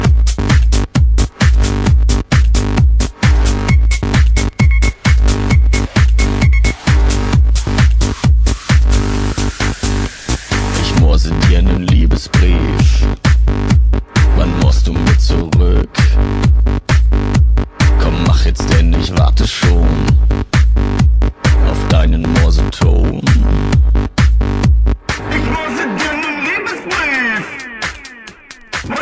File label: Tecktonik Music